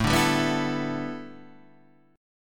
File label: A 9th Suspended 4th, chord; A9sus4 Chord {5 5 5 4 5 5} strummed